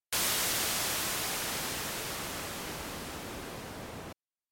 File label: Shelf Filter